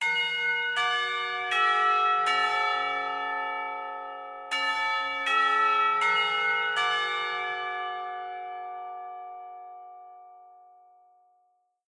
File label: carillon